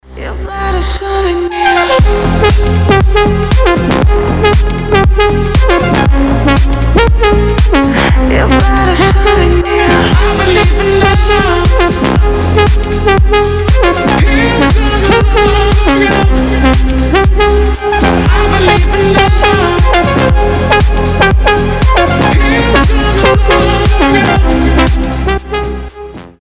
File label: organ